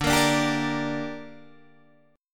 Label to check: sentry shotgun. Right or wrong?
Wrong